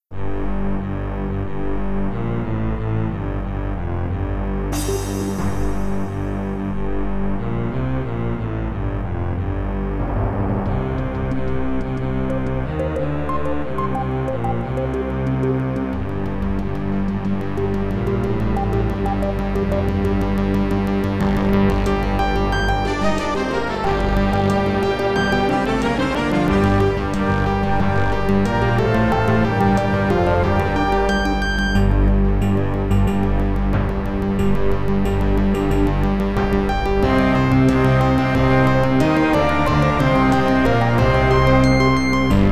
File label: Death Tune, MT-32; Inferno; MS-DOS